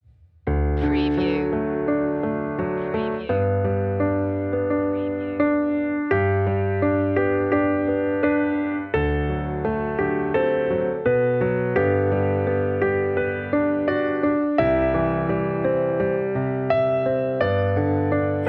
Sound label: auld lang syne intimate 85bpm